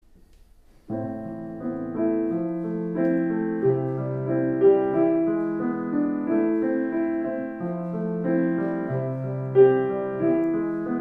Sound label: piano